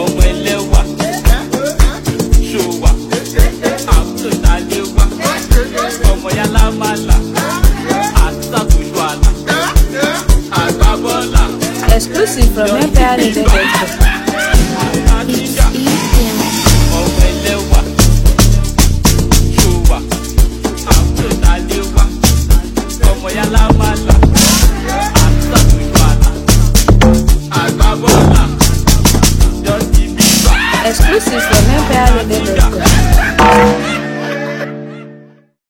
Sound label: ePianoh Amapiano Version